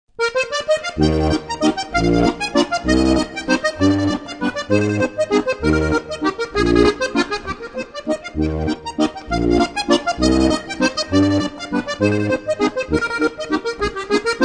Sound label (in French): valse variation; fete du musette